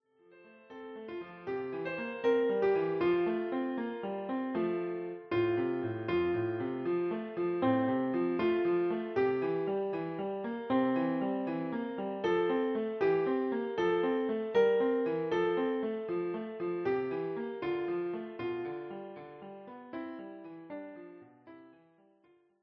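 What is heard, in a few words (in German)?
Adeste fideles, Klavier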